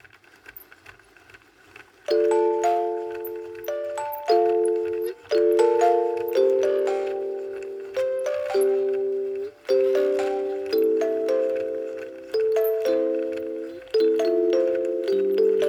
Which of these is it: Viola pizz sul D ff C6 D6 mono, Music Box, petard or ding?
Music Box